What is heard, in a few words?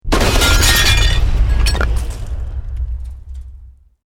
EXPLOSION MEDIUM BRICK WOOD GLASS NO FIRE